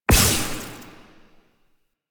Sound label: Corruption Pickup Small; Warframe; Missions; Zariman